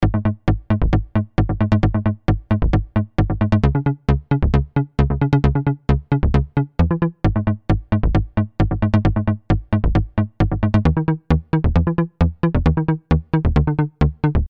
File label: Kontakt7 synth